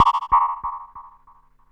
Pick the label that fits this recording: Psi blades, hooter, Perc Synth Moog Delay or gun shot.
Perc Synth Moog Delay